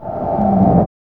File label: wind